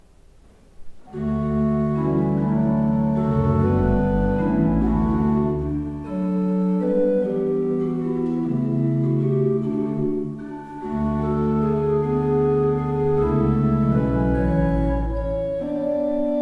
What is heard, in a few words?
Ott, pipeorgans